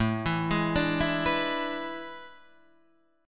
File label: A Major 7th Suspended 2nd Suspended 4th; AM7sus2sus4 Chord {5 7 6 7 5 7} arpeggiated